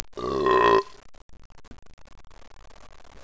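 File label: burp, authentic belch; The Breakfast Club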